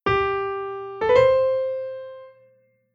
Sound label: grace note